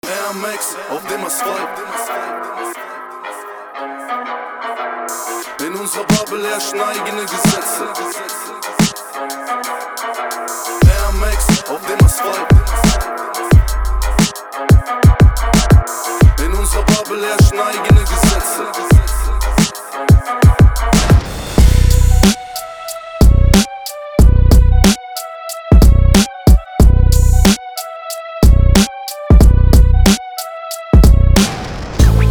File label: DRUMKIT; AIR MAX